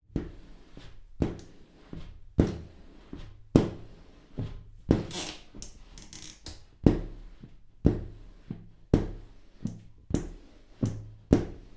household window wiper squeegee clean bathroom shower glass door